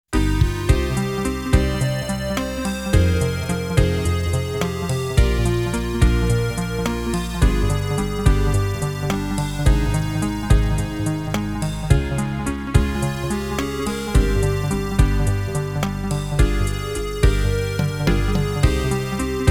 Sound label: Tension; Ys IV; J.D.K. Special; The Dawn of Ys